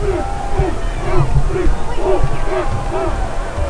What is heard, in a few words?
CHANT; LEGEND98